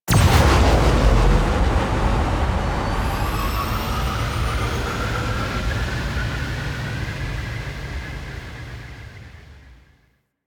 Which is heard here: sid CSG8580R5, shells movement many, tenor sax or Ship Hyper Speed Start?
Ship Hyper Speed Start